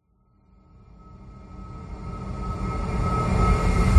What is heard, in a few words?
sound design riser airy dark tense